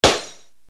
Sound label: RX7 GLS BRK, Yamaha RX-7